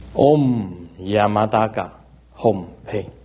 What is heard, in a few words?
yamantaka heart mantra